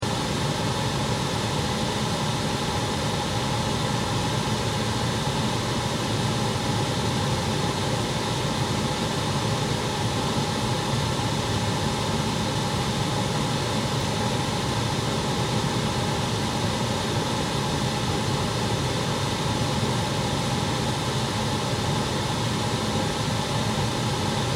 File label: white Noise fan